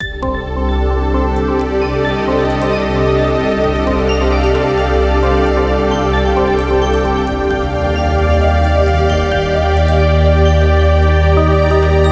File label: Eerie Feeling; Spectrobes: Origins; Wii